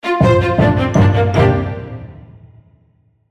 logo orch

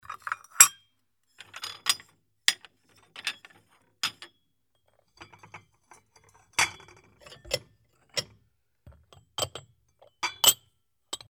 dish

